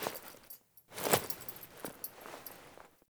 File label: Foley Action Default Soul Steal, Foley Default Clothing; Characters; Player